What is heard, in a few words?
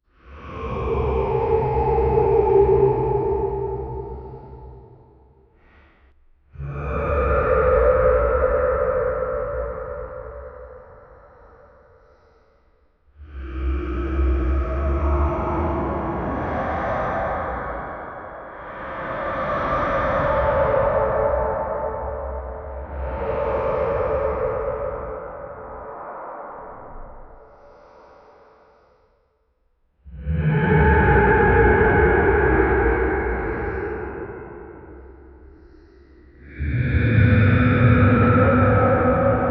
horror dark demon, dark